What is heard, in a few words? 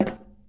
metal, footsteps; gmod